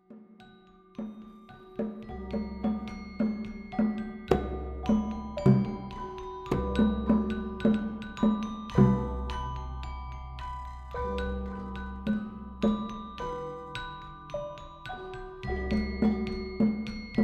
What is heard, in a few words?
Gamelan Demonstration